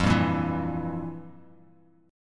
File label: F Major 7th Suspended 2nd Suspended 4th, F, down, Bass Standard; FM7sus2sus4 strummed; JGuitar